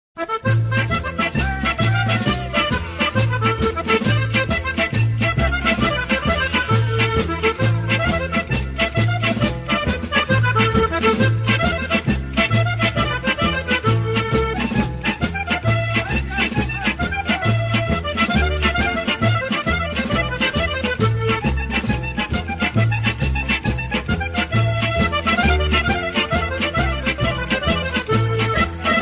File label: tarantella siciliana ringtone